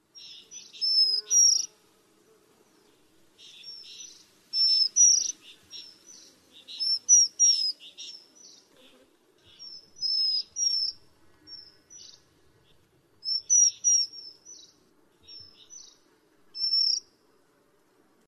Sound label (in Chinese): 灰頭花翼; 畫眉科/留鳥